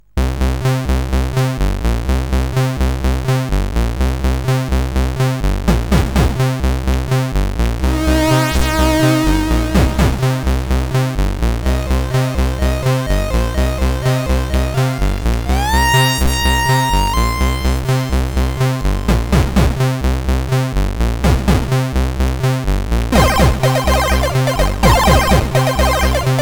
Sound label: sid CSG8580